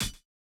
hihat, house

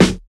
Hiphop snare

